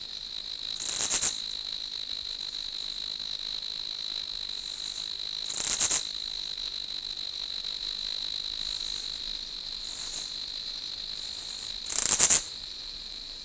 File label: crickets; Star Wars Knights Of The Old Republic II; XBOX